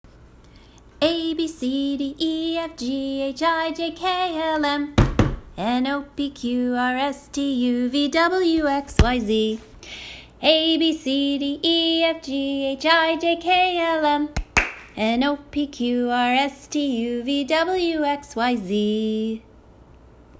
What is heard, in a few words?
ABC Song British Style for washing hands